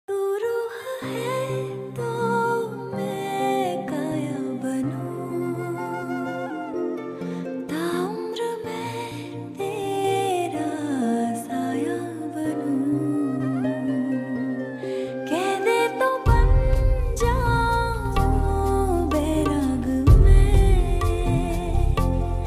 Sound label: female song ringtone; brothers movie song